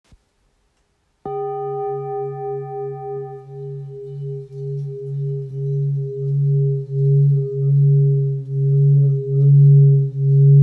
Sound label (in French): Bol bengale do diese